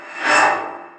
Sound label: Timer; PC Gamer IT CD 48; trickstyle; TSdemo